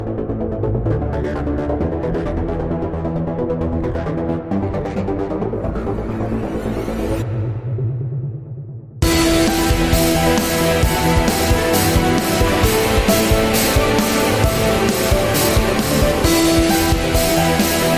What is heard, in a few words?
Scary Dramatic Zombie Chase Mix